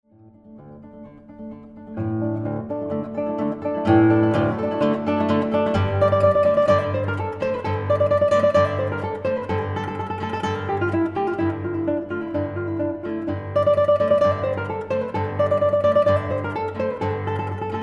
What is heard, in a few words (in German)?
Gitarren Duo